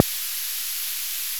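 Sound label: long snare, Sound Master Memory Rhythm SR-88 drums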